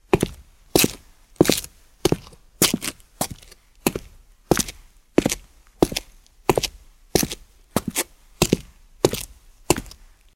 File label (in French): pas